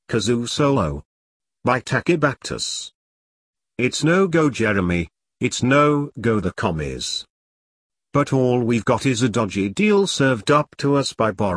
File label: Kazoo Solo